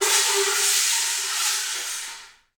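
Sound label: wind bull, Africa, Afro horns, bullhorn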